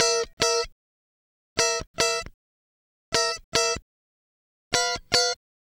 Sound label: Guitar Licks; Best Service ProSamples vol.17; AKAI